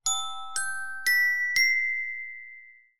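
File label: Magic Bell, notifications; ZTE Nubia V70 Max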